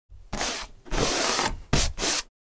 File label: Paint brush painting wood various short strokes